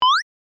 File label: message, notifications; K-Touch; Nibiru-H1